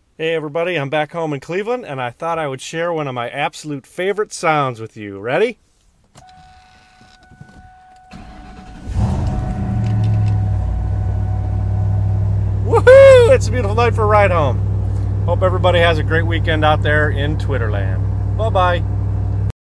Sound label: mustang